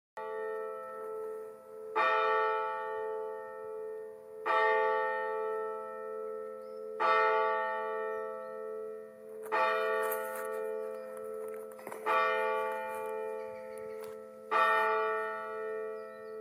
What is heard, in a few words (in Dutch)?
klokken zuid-beijerland, het geluid van de kerkklok; Dorpskerken in de Hoeksche Waard